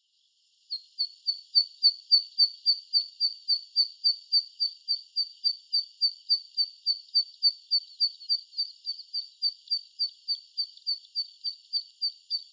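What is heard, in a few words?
zitting cisticola, watarase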